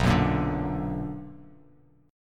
B Minor Major 7th; strummed; JGuitar

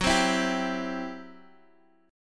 C Major F sharp

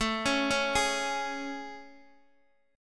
A Major 7th A, Guitar, Open D

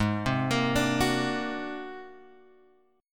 G sharp 9th Flat 5th G sharp Guitar Open G

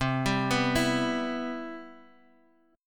C Major 7th, Guitar Open G

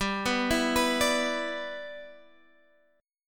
G 6th, Guitar, Drop D

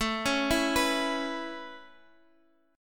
A Major Add 9th A, chord

